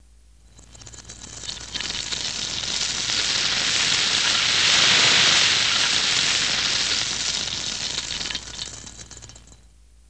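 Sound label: Electric Sizzle Reverse Loop, cooking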